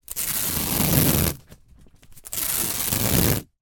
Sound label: industrial masking tape peel off surface x2